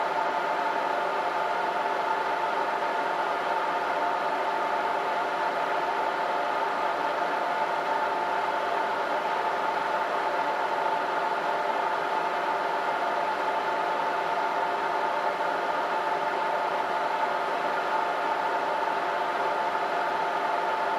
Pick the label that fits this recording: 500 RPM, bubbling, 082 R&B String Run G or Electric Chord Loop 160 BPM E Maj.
500 RPM